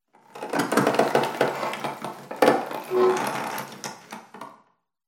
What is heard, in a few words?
wood, ambient; alyx sanctuary; Half Life Alyx